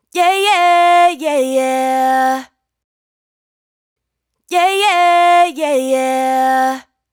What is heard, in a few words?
riff Cm, Dry Vocals; Raw Vocals; Slate Digital Omnivox Vocal Sample Pack